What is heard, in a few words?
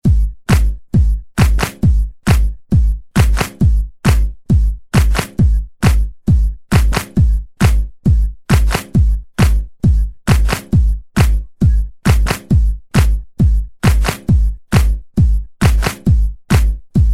Rock n Roll